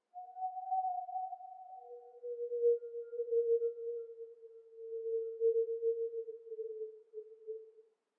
wolf

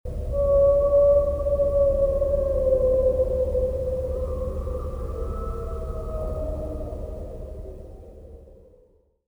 whales; ambient

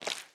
slime run, step; blocks; Minecraft; Remastered

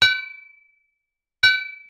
anvil; ambient

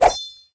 sword swing; Minecraft; Remastered

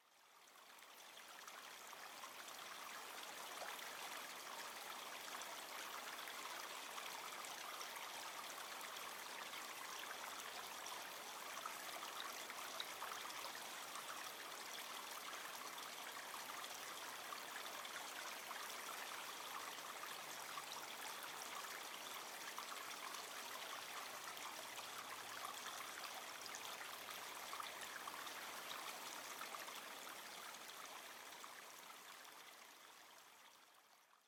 water run; ambient; liquid

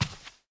rug walk, step; blocks

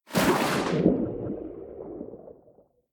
enter, underwater

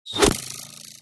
bow hit; Minecraft

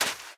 sand run, step; blocks; Minecraft; Remastered

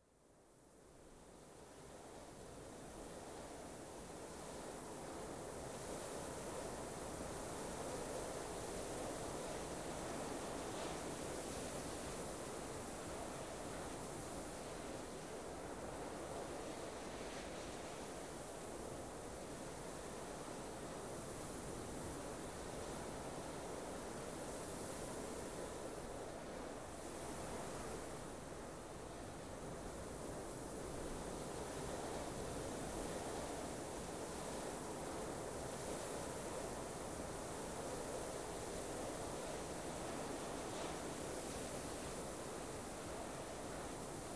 lightwind, ambient wind

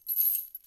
chain, step

